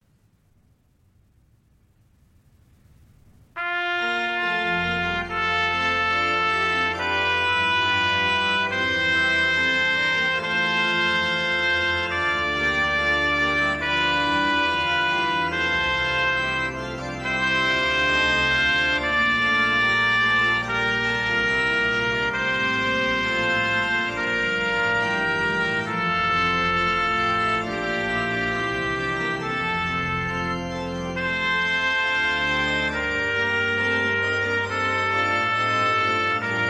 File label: Chorale Prelude For Organ; Lob Sei Dem Allmächtigen Gott (I); BWV 602; Orgel-Büchlein No. 4; Trumpet Voluntary